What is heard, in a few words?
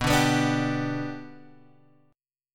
B Minor Sharp 5th, Guitar, Open G